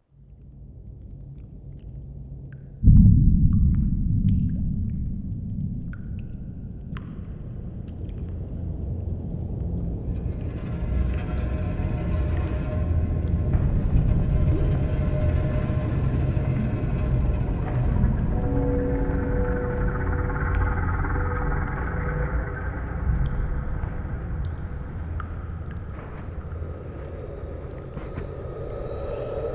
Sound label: Atmosphere Interior